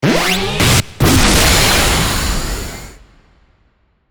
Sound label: Laser